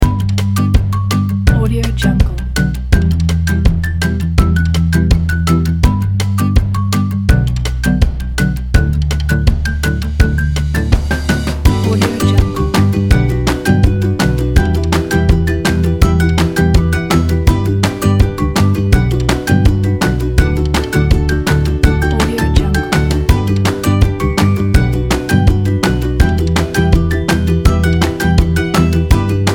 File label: happy childhood